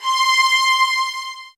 SOFT VIOLINS

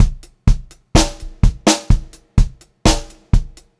126 POP BT, Pop-Rock Drumloops; 126 POP 4

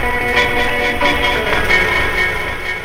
War Reggae; M8

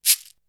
Shake, Drums; ZERO G - Datafile DISC 3

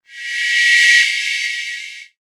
X MACHINES, Production Effects; Best Service - XXL Production Effects

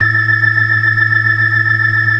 FAST VIB, ORGAN, TREMOLO VIB

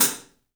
3D Drums, TIGHT ZILDJ; 3D Sound

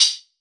Crisp Chuf, Hats; Airwindows

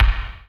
BD, DR BD; Best Service Gigapack 2

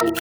Perc Random

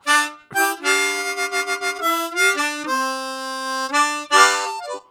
Double Track, Harmonica, Riffs; Zero G

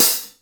Hi Hats; InVision Interactive; Lightware VOL-1

